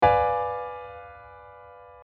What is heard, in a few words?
B dim